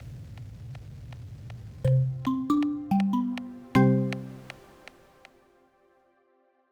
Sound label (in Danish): lydlogo; Alexander Vienberg; audio branding for laenken